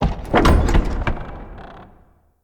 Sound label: Winch Stop; UnderCity